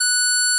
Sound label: Odd Increase F#6